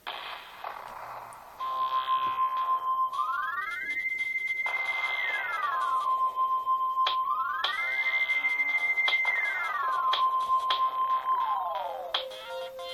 samsung sghe700 ringtone

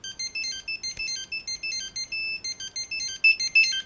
nokia 3210 reveille ringtone

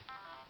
motorola razr ringtones chord low

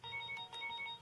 nokia 2610 ringtone glitzy

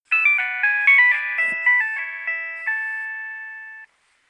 nokia3100 ringtone

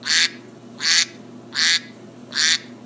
duck ringtone; apple iphone 3g 4

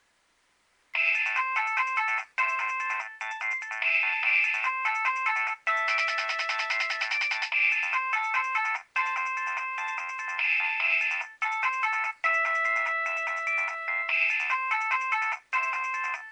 nokia3100 ringtone

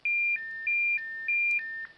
motorola razr v3 ringtones siren

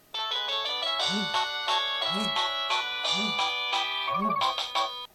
samsung sghe700 ringtone

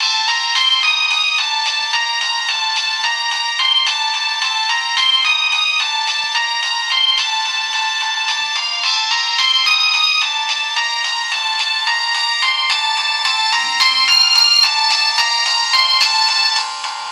nokia 1600 ringtone; rollon

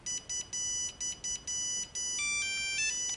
nokia 3210 jingle bells ringtone

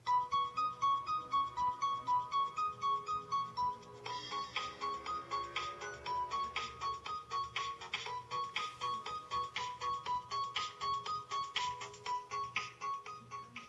nokia 2610 ringtone backpacker

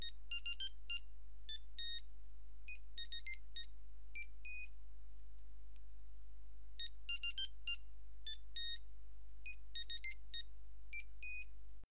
nokia 3310 thats it ringtone

